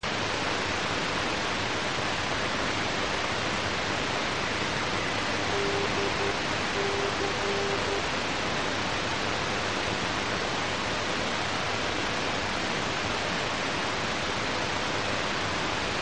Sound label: 250 kHz